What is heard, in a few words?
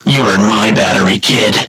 game over